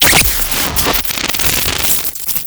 Grapple Electricity Burst; Enemies; Fortress; Warframe; Grineer Nightwatch

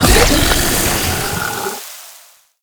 Flying Mouth Spawn; Enemies; Warframe; ManInTheWall

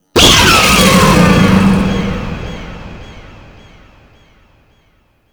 Vay Hek Frame Jump Attack Roar, Vocals; Warframe

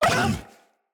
Kubrow Feral Vocal Pain

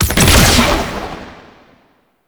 Drop Beam End; Enemies; Weapons; Warframe; Tau; Sentient Dactolyst

